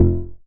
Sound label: bass F1, Oberheim OB-Xa